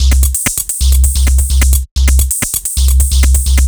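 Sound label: Odd Glitch, 130 BPM, Percussion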